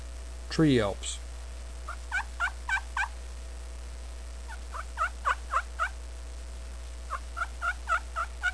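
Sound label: glass tree yelps, turkey call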